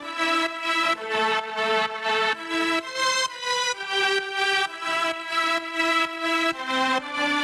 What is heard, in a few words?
129BPM Hiphouse Melody; VFH1 129BPM Hiphouse Kit